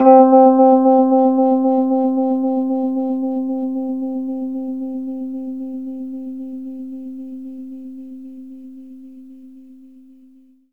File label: Rhodes fast C; 3D Sound; 3D Keys VOL-1